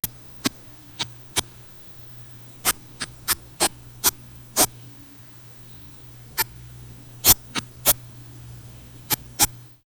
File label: chest opening sound for 2